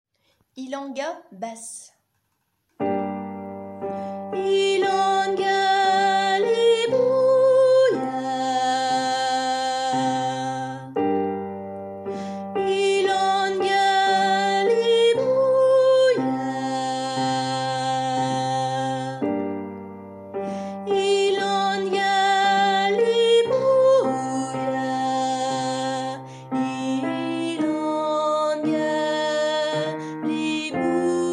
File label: Ilanga bass